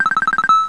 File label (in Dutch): Alarmering; RDCS Wake-up alert